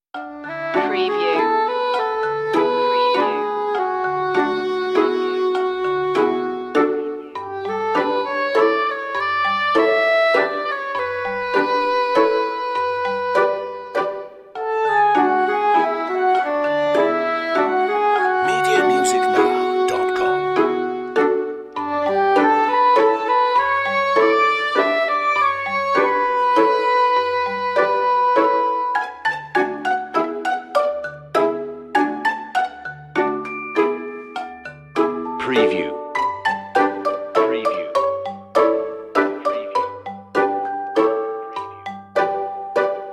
no bass perc

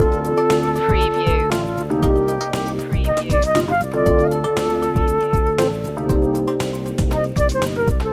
flute and fruit loop

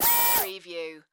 Servo Motor; sound effects bible

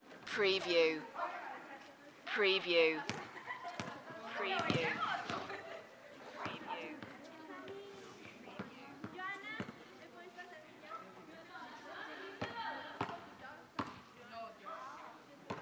playground ambience

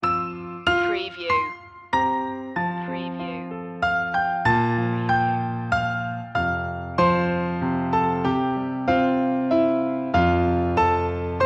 piano; the first noel; media music now

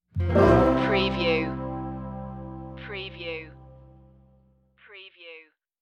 happy western stinger

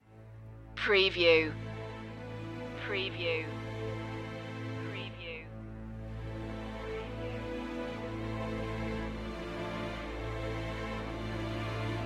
strings brass

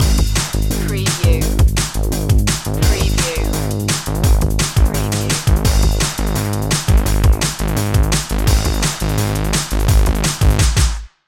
lost in space loop

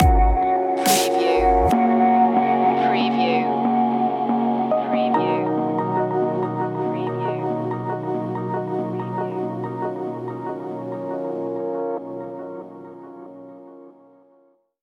oceanic stinger

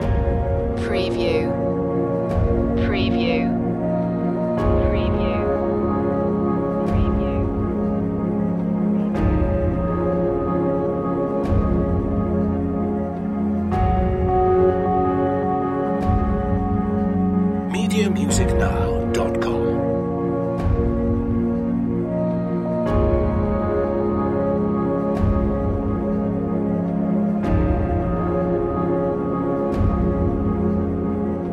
beautiful loop

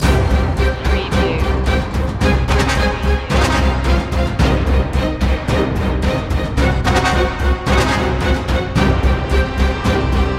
super trailer loop

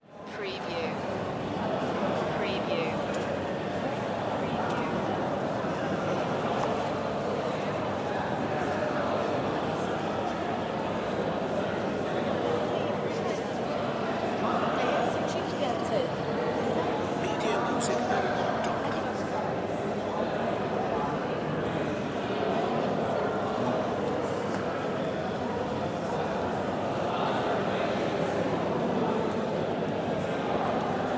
Italy Museum Crowd; Sound Effects Bible

